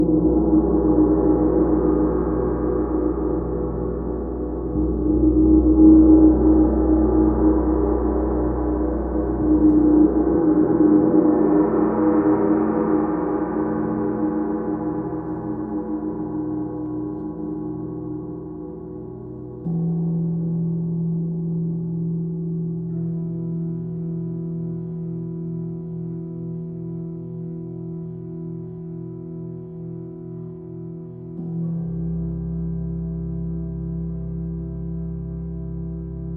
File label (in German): gongs